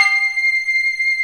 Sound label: FILM SCORE; Greytsounds; Scott Peers Sound Engineering